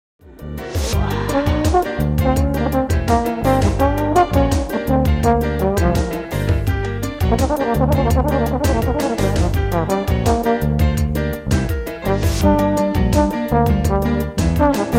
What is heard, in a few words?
SWING